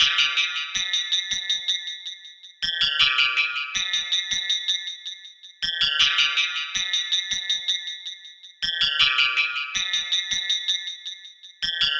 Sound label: metallic loop 160 bpm